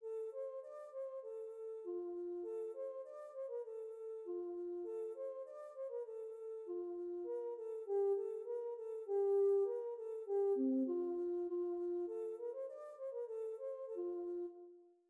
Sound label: Lullaby Flute; Grieg